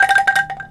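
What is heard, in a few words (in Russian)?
сияние лучей короткий монотонный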